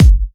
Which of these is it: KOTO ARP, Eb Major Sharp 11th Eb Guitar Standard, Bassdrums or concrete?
Bassdrums